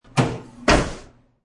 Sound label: Open Broiler, Opening a broiler.; Food Prep; Restaurants; SFXsource